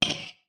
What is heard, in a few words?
Futuristic Weapons Sound Effects